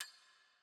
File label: ambience room metal base